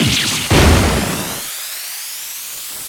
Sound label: breakdown; sorin